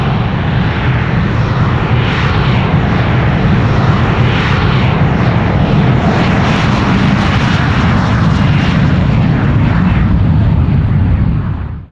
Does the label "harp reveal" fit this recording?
No